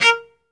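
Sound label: SOLO cello, Strings; ILIO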